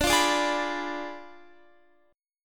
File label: D Diminished 7th D down Guitar; Ddim7 strummed